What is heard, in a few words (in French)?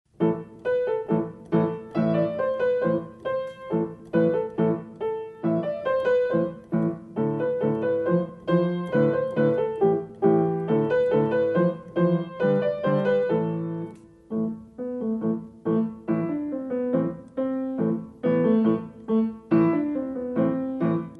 danse Bulgare